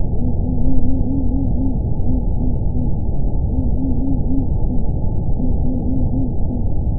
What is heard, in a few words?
trill 700msec, Empidonax alnorum; Passeriformes; Tyrannidae